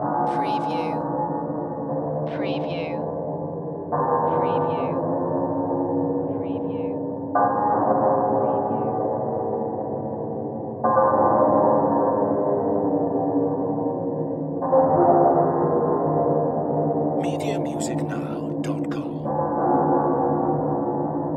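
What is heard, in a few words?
Production Element Bed Metallic; Sound Effects Bible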